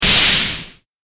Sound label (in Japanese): 衝撃